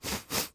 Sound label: Human Male Sniffs